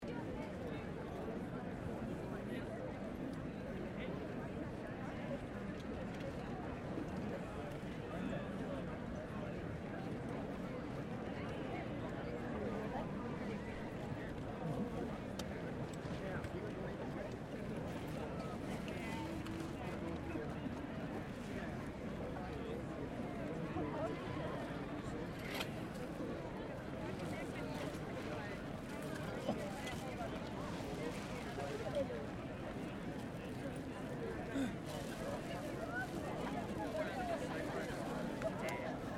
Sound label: AMBIENCE CROWD OUTDOOR EVENT; Crowds